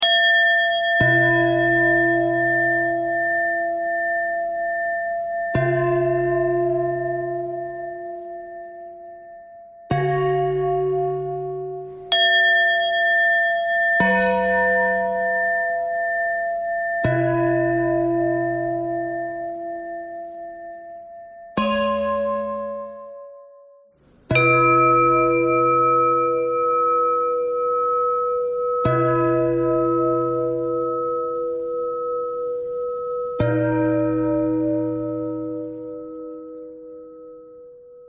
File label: bell threads